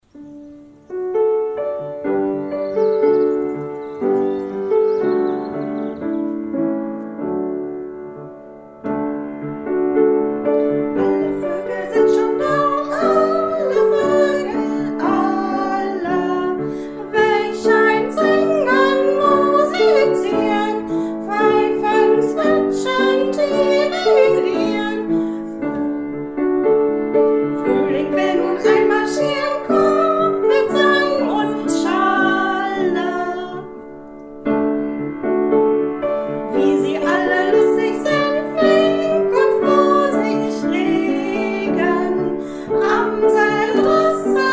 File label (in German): alle voegel zweitstimme